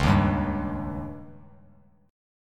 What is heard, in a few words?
Db Suspended 2nd Flat 5th, Bass Standard; strummed; JGuitar